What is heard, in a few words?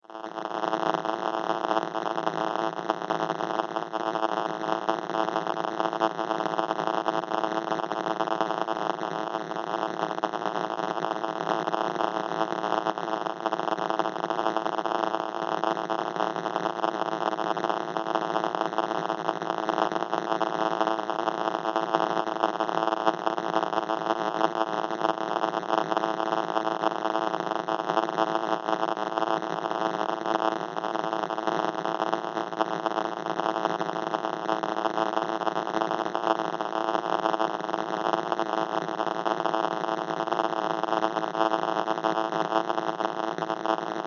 Science Fiction Electric Arcs Constant; Electrical; Sound Design